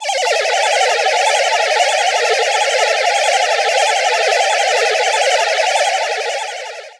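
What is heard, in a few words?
Help Alarm Loop; Pac-Man Championship Edition 2 Plus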